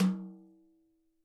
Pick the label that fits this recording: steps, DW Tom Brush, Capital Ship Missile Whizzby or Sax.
DW Tom Brush